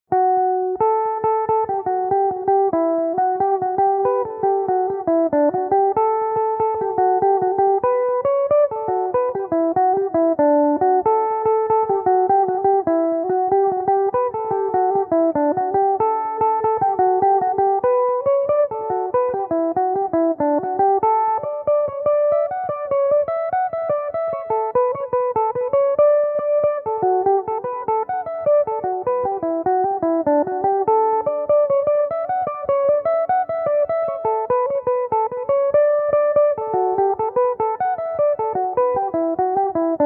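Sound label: jig D major belmuse, irish mandolin; boylans jig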